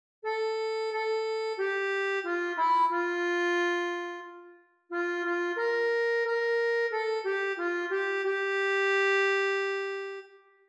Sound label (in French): ACCORDEON; verset 2 accomp.; Tu Es Pretre a Jamais